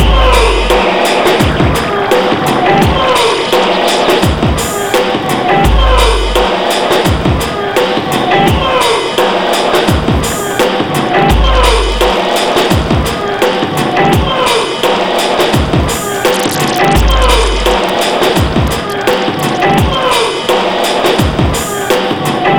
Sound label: 85 bpm; 90 s Sample CDs; Planet of the Breaks